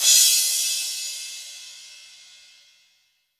crash sound a key; cymbal drum samples; single hits one shots

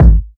puffy kick sample g sharp key; Duration 0:01; Channels Mono

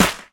dry snare sound F sharp key

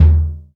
wet kick drum single hit D# key

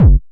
natural house kick single shot g key; kick drum samples

